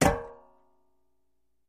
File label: Metal Hit Ring Soft, small impacts